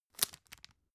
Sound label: tissue open